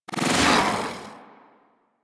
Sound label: Teleport Out; Warframe; Teralyst; Ropalolyst; Abilities